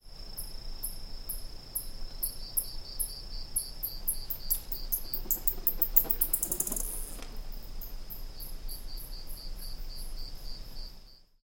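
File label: white striped freetail bat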